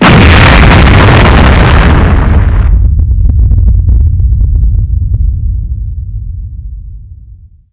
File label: kaboom; quake2; coop